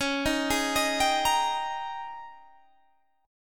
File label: Ab Major 7th Suspended 2nd Suspended 4th Db